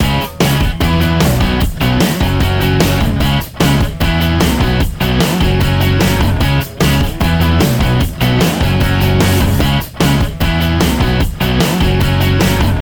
Music Punk; Learn to Fly 3

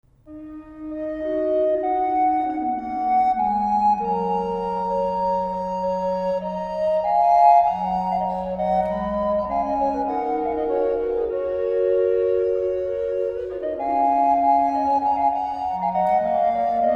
Instrumental Piece; Civilization IV Colonization

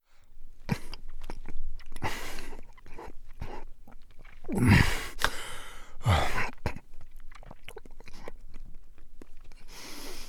Foley Eat Greedily

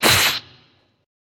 Fight; boomerang fu

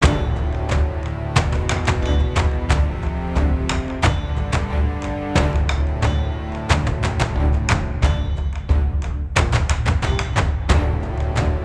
Music Barrack

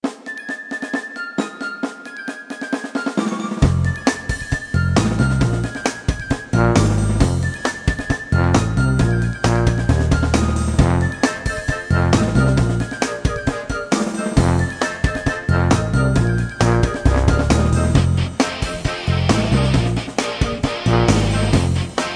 battle loop city; guns n glory wwii android game music

